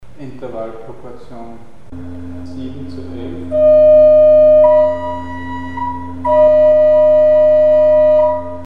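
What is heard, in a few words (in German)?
Ekmelische Sext mit Frequenzverhältnis 7zu11; Mikrobuch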